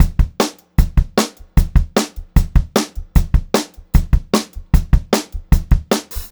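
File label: 152 speed 2 beat, pop rock drum loops; USB Soundscan vol.37; AKAI; 90 s Sample CDs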